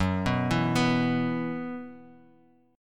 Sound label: F sharp 7th Suspended 4th, Bass Standard; F#7sus4 arpeggiated